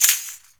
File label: RAKATAK, Heart of Africa; Spectrasonics